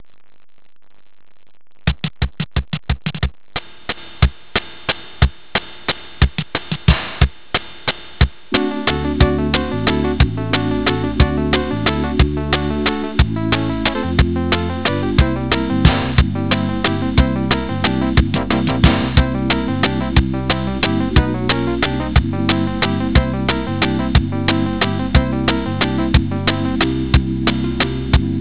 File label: keyboard